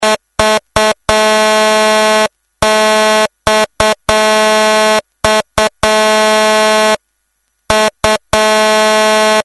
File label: Air horn